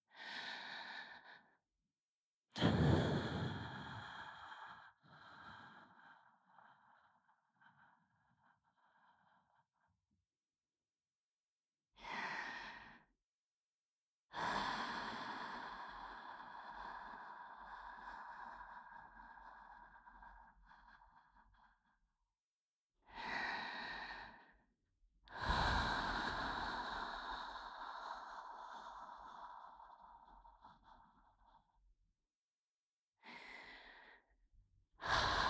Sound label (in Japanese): 吐息のみ 右耳, ASMR, 吐息, バイノーラル; しこしこオナニーサポート